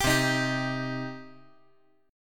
C Minor C down

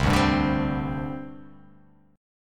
C Suspended 2nd, C, down, chord; Csus2 strummed

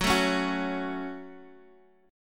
F sharp Minor, guitar; chordshape; down

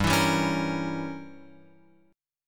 G-Diminished 7th-G-down-Guitar-Open F; strummed; JGuitar

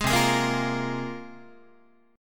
C 7th Suspended 4th Sharp 5th, down, Guitar; strummed; C7sus4#5; 11,8,11,8,9,9

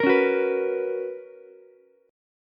B Major 7th Suspended 2nd Ukulele, chord; BM7sus2; strummed; JGuitar

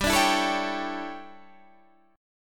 D Major 7th Suspended 2nd Suspended 4th

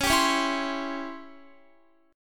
Db Minor Major 7th Flat 5th Db down Guitar; strummed

